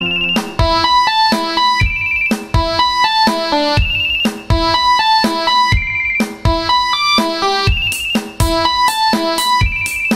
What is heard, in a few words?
Cute Ringtone; Samsung